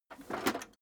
metal plate armor handle

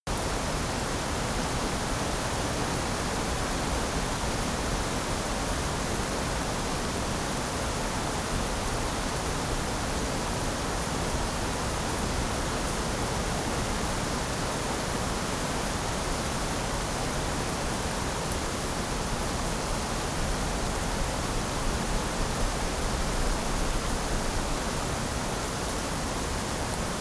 generic rain loop strong

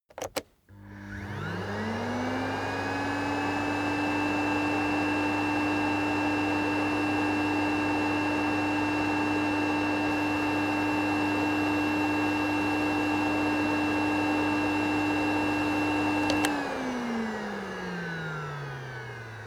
vacuum low

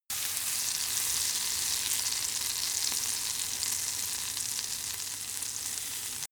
frying pan sizzle

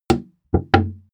plastic dent

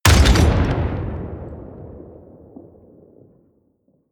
artillery medium close

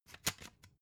cd in cover fast

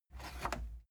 cassette eject push

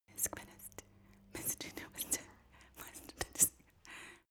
female whisper sequence

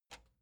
metal button spring release

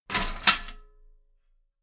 metal ladder small handle unfold heavy

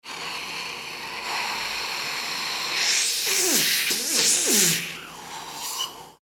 aspirator

